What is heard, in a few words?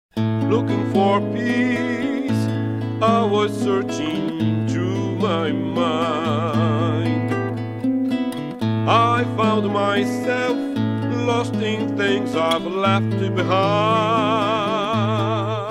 Guitar